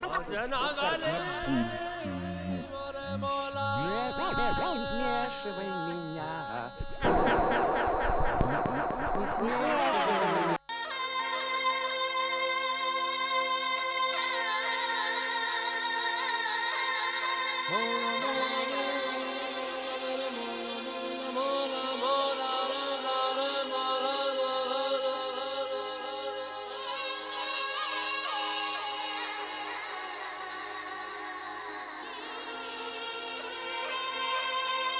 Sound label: Vocals; PixelJunk Shooter; PS3